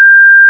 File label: beep fs 10000; digital speech processing course